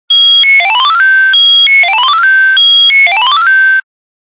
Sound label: 8bit; RingTones